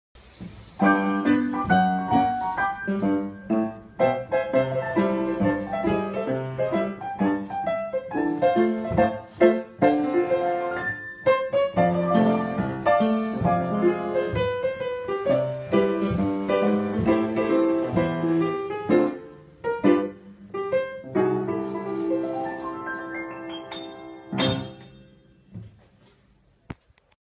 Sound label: ringtone ragtime on piano; iphone